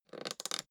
suitcase open latch lock slow

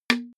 hit plastic bowl

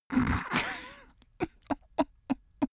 male laugh snort

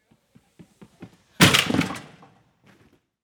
girls springboard

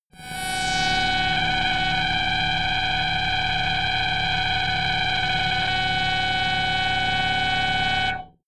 glitch scanner

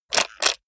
robot handling

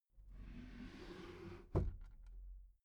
desk drawer open soft

